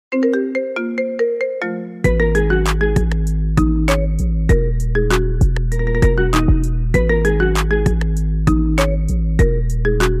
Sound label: marimba remix ringtone